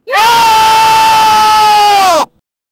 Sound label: a man screams ohh; danger